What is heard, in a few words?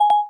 Siri Stop Success Haptic; i OS; iOS10; nano